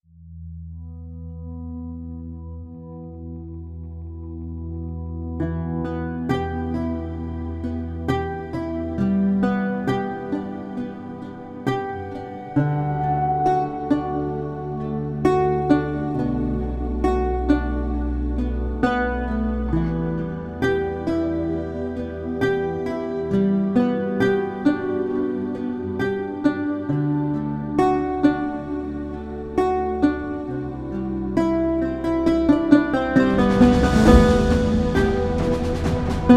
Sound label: lute